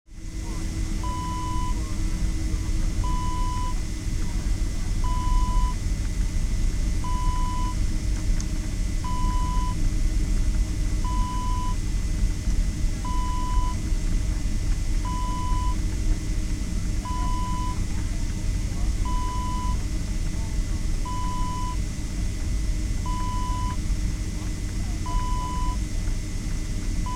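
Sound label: aircraft taxiing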